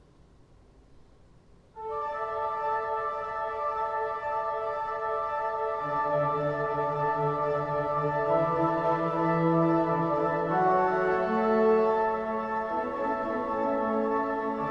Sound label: Impro, Kirchenmusik